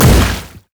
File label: satchel charge; LoL; ziggs poolparty